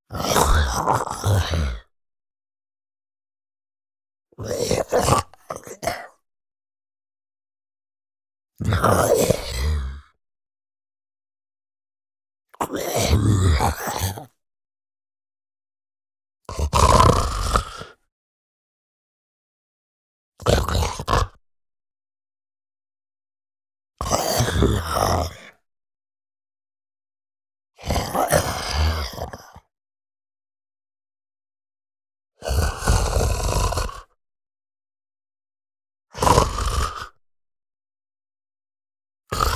ZOMBIE MALE HUGE CALM WANDERING BREATHING GRUNT GROWL